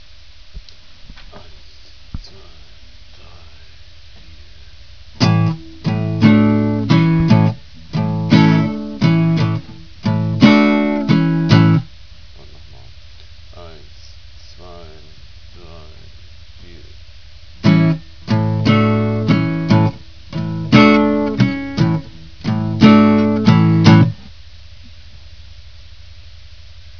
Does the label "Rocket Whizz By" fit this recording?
No